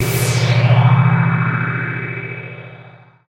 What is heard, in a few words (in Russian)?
logotip nachal svetitsya